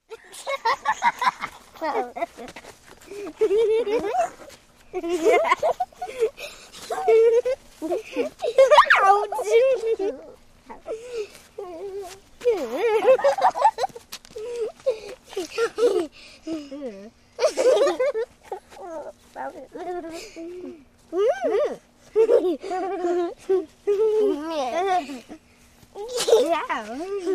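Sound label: Two Small Girls Tickle, kids play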